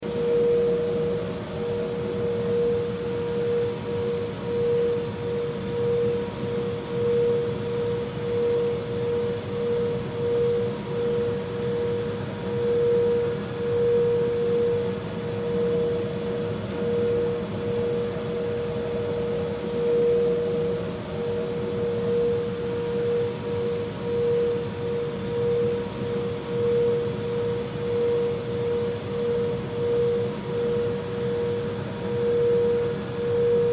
Foam Cutter, Foam Cutter Machine Metallic Whine Loop; Machinery; Industry; Sound Effects:Machines; Blastwave